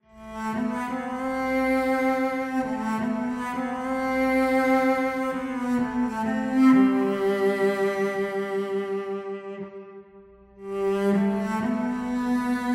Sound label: Cello; King Layers